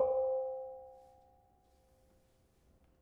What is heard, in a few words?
Bonang Barung soft, gamelan